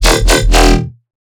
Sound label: Riddim Bass Hit E Ugly; One Shots; Ghosthack; Riddim Essentials 2020